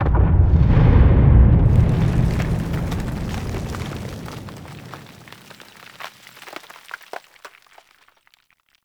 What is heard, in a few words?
Battle Ambient Tunnel Rumble; Warframe; Tau